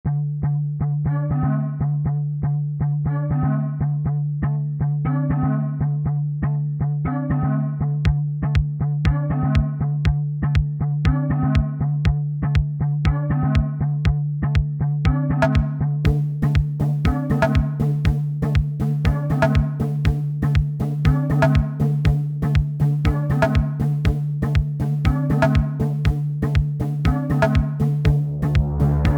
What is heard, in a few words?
happy jig; weeklybeats 2026